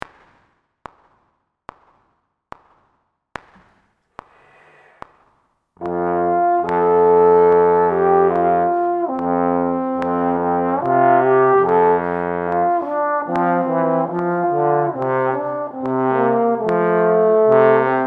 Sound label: Maj F, trombone; The Tone Ladder